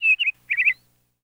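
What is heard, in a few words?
Meadow Lark Happy Chirp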